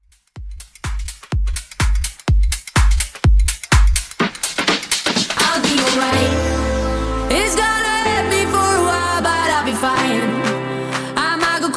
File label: Club Redrum, 125 bpm